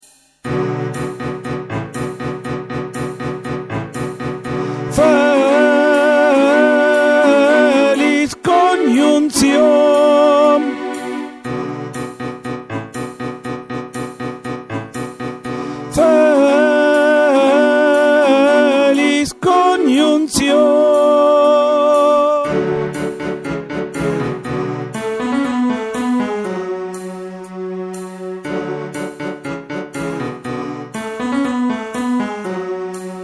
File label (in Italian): tenori; Carmina